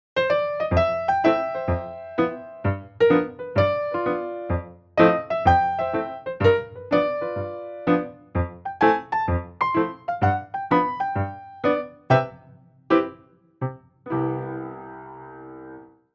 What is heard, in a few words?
Vivo S1 Vivo Upright